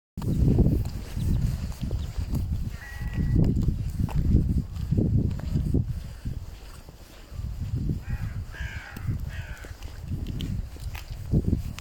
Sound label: raven